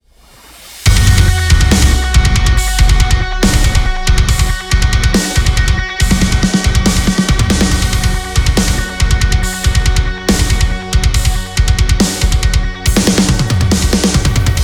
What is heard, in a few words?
Snare